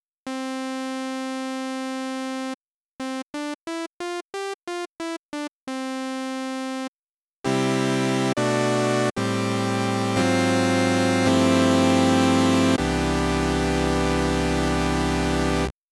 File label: saw